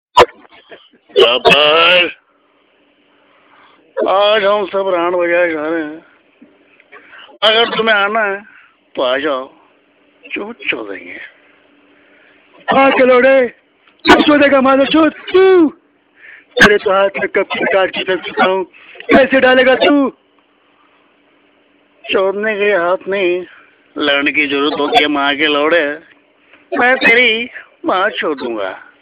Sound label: Gabber